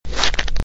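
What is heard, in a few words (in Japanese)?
jari, gravel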